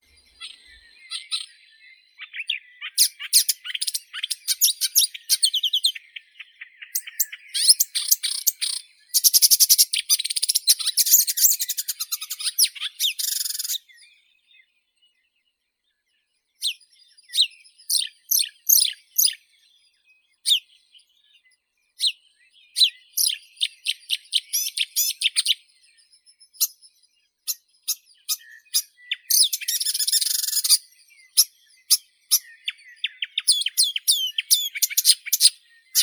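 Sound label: marsh warbler, Acrocephalus palustris; kamishovka bolotnaya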